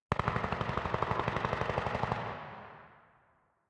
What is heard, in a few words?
landing gun auto; smite; Ares